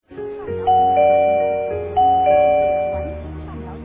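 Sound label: ding two tones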